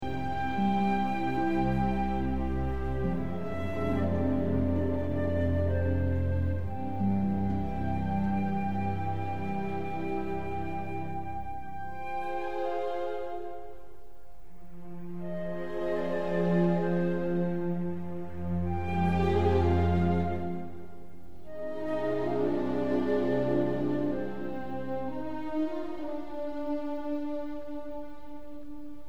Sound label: flute